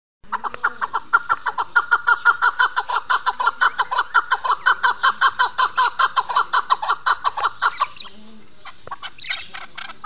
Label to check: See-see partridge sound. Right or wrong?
Right